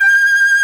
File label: SHIAO G4